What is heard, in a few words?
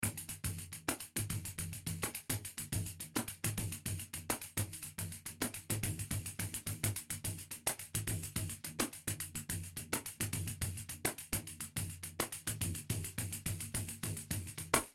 pandeiro